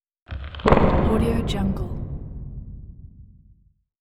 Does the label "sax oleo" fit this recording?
No